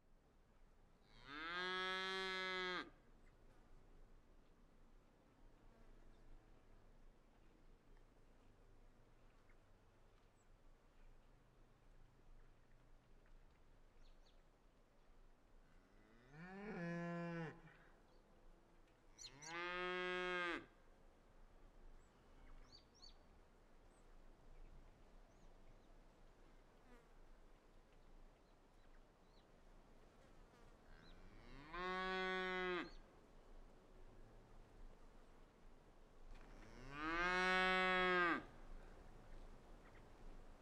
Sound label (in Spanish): VACAS MUGIENDO EN VALLE ANDINO